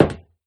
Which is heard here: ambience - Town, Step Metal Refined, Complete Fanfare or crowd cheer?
Step Metal Refined